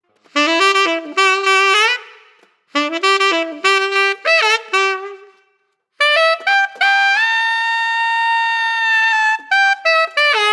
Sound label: Altissimo Reverb; Lakshmi